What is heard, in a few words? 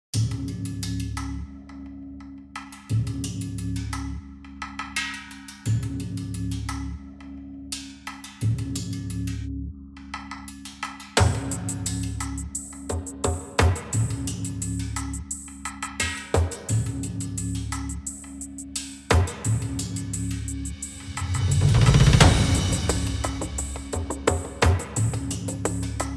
atmospheric drumloop